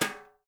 BRUSH TIMB; Spectrasonics; Liquid Grooves 1 & 2; BR.TIMBALES

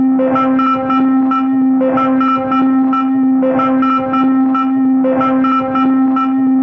Crude Tape Loop, Tape delay; radiosonics

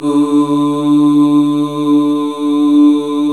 Male Ooz; 90s Sample CDs; Club-50 - Foundations Roland

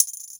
BeatR FX, Drum Machines, E-MU Orbit-3

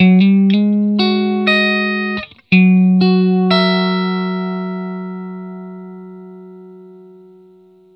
TURN 1 CS, Vintage Blues Guitar, 120; USB Soundscan vol.22; AKAI

